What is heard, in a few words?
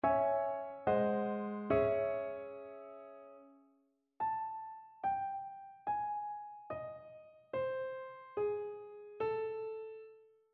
melodic fragments